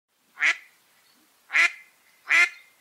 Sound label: duck